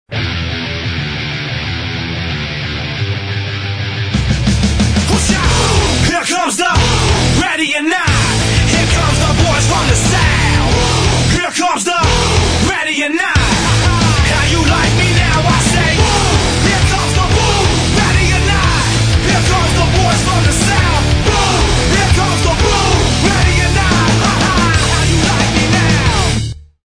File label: boom, ringtones